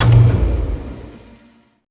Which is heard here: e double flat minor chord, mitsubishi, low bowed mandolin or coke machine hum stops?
coke machine hum stops